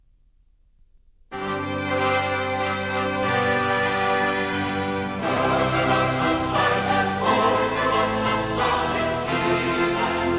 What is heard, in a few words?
Choir